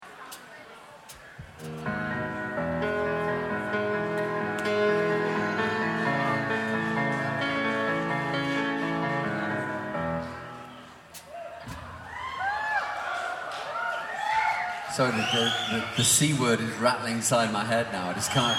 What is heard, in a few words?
false start